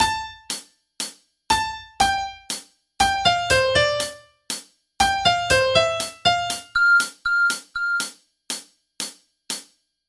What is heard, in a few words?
samsung tune